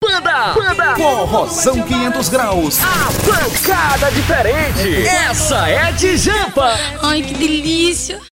vinheta da banda

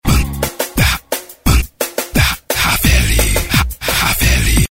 vinheta banda

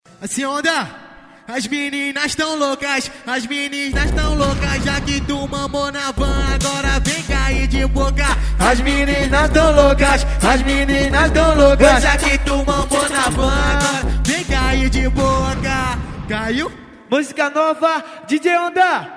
aquecimento ao vivo

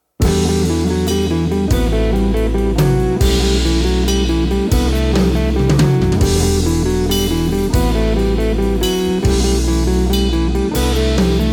instrumental

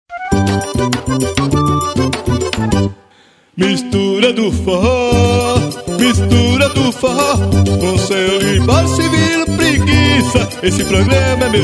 jingles forro; mistura do forro lide fm

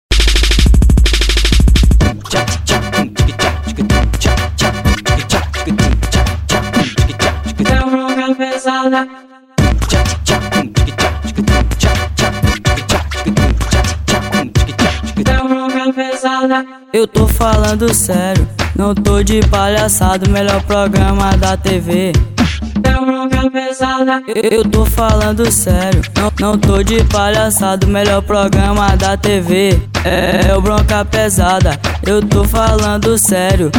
musica bronca

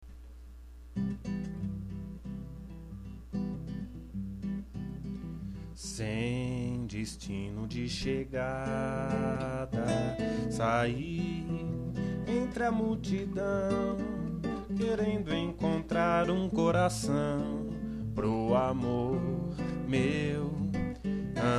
samba

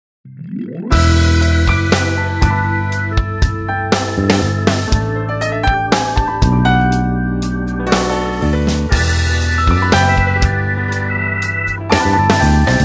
piano orgão

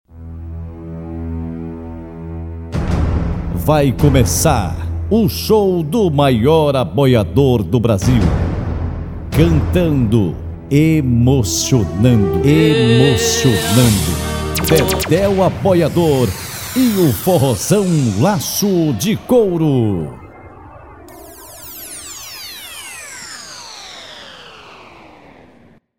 abertura

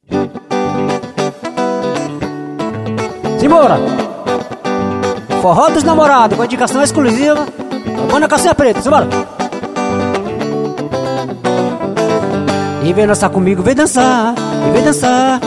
forró

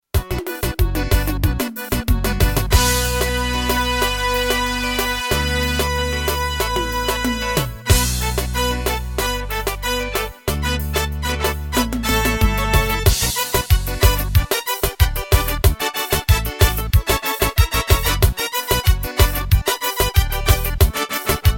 ritmos korg pa500 pizadinha

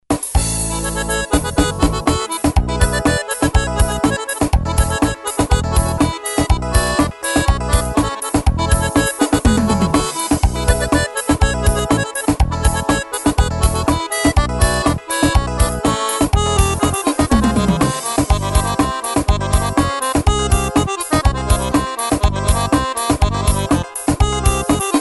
ritmos forro

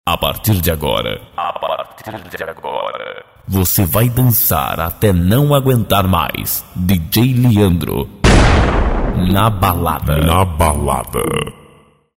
vinheta na balada

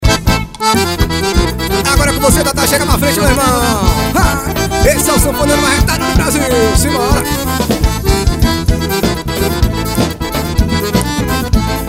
xote instrumental ao vivo

